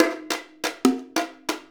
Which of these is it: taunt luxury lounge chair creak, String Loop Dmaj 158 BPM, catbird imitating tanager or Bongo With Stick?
Bongo With Stick